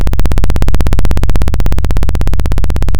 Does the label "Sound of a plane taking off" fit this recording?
Yes